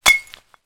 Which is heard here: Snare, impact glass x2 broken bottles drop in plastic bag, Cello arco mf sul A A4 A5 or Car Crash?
impact glass x2 broken bottles drop in plastic bag